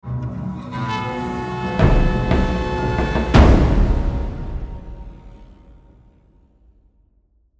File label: Intense Drums and Battle Horns End